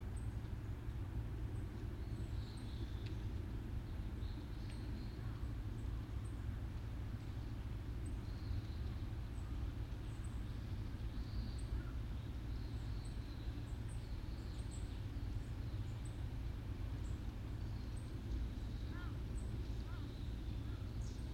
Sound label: yamanakako sound